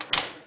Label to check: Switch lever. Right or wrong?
Right